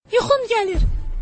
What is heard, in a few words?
yuhum